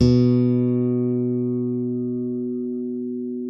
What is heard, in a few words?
ALEMBIC BASS FING B2; Masterbits CLIMAX 7 - Bass